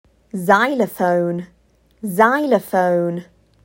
Xylophone

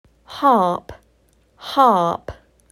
Harp

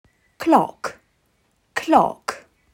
Click

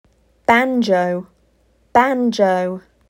Banjo

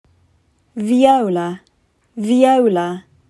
Viola

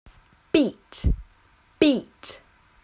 Beat

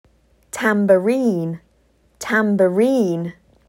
Tambourine

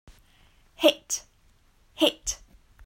Hit

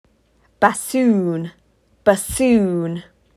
Bassoon

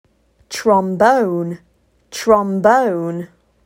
Trombone

